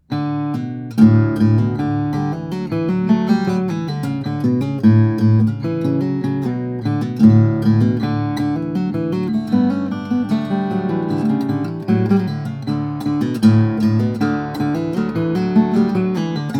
guitars; Rasmussen 00; brazilian rosewood; swiss spruce; flattop

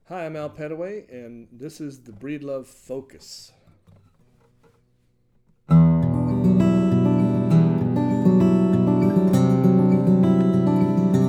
steel string guitars, flattop; breedlove focus

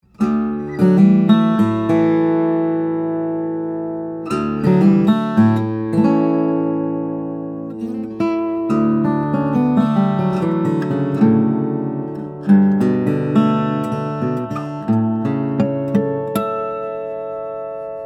Taylor Liberty, steel string guitars flattop; Taylor LTG Liberty Tree Guitar; tulip poplar; spruce

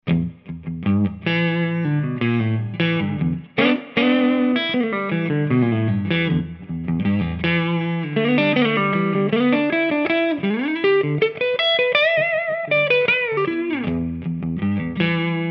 Spalt, electric guitars; the nouveau series limage profile no 3; spalt instruments

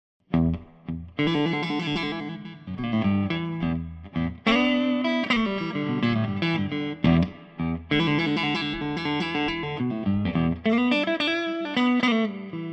relic stratocaster, electric guitars, solid body; monroe; srv

